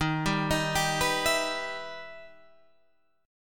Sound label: E Minor Major 7th Eb